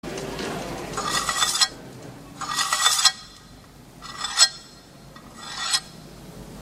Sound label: blade scraping with echo